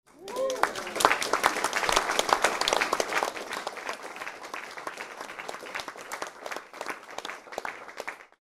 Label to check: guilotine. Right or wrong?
Wrong